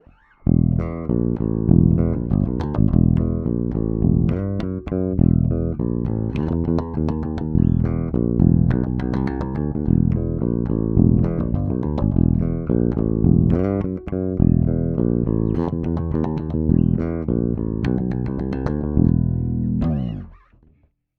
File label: Epi Flying V passiv dry